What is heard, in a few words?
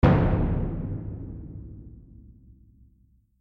Drums; Granny2 Diff Drums1